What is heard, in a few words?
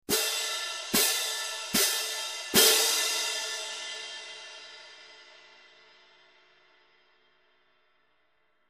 18in Stadium Medium Pair, cymbal sound